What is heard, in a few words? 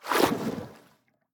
enter, ambient underwater; Minecraft; Votive World